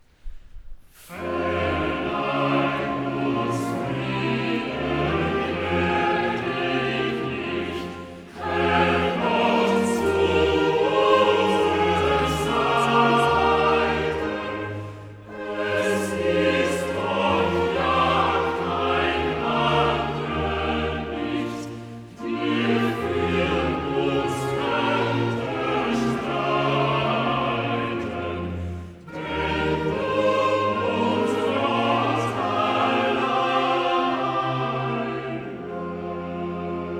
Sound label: Choral; Cantata, BWV 42 'Am Abend aber desselbigen Sabbats'; 'Verleih uns Frieden gnäichlich; Bach; Arnstadt; 1st Sun After Easter